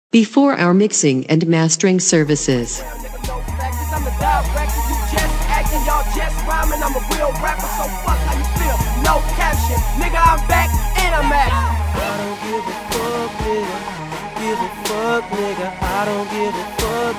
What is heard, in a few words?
trap; Mixing and Mastering Samples